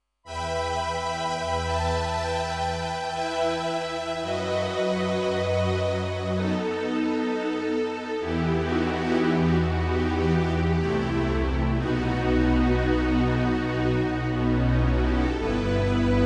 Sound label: Strings